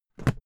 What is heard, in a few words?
briefcase close soft; industrie wirtschaft; bueroarbeit